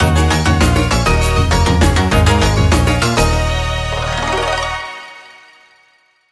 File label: Relic Fanfare (Item Got); Project Kijo (cancelled game); Touhou